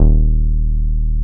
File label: D#1, BASSES